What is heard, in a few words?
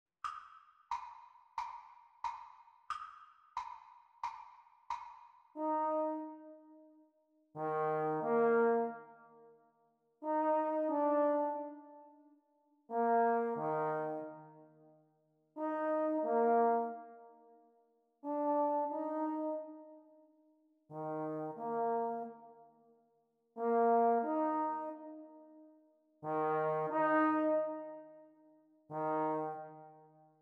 Playalong for Eb Horn Exam Speed